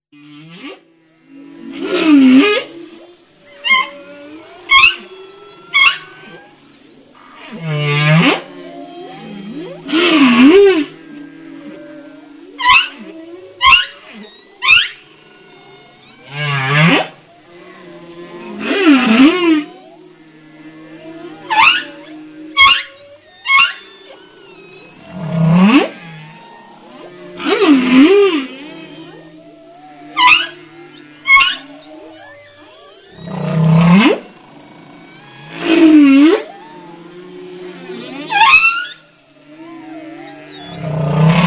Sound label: humpback whale